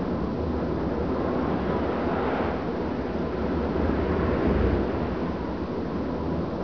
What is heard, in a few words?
wind swirl